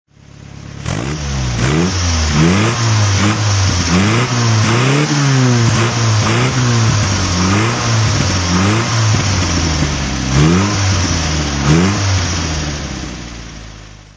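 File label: Triumph TR4 throttle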